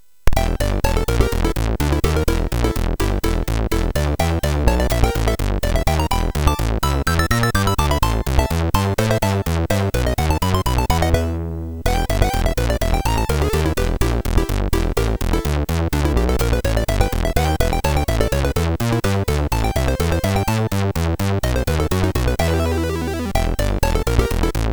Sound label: Piano Jazz, MOS6581R3